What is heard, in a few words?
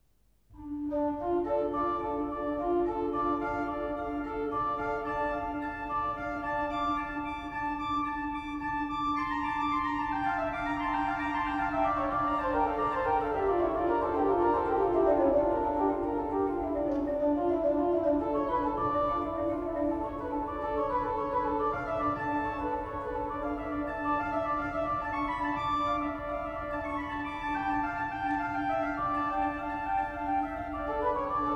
Concerto for solo organ No. 5 in D minor; after Vivaldi Op． 3／11, RV 565; BWV 596